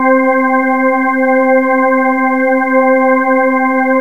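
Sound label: D50 organ; awe32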